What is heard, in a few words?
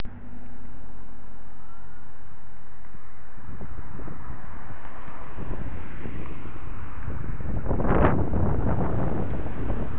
traffic and wind